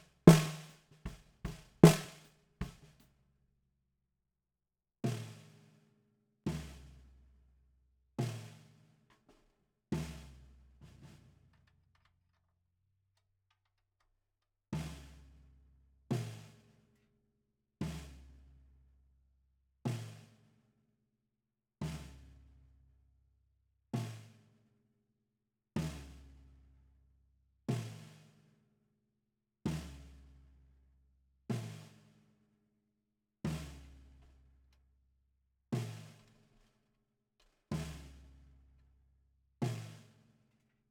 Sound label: SNR